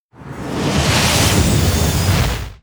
Spell Sacred Trial precast

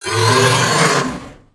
Stranglethorn Raptor Enter Play

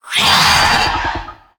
Frenzied Felwing Attack

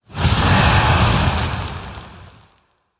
Sand Art Elemental Death

